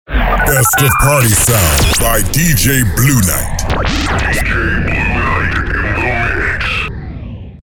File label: Party Sound with Effect